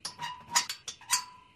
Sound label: Gate Glen Oaks Latch And Squeak; gate & big rolling